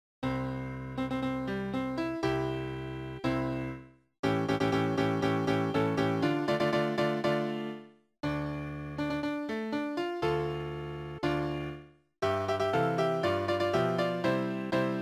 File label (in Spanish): Himno Nacional de Colombia Piano; Tutorial Piano Synesthesia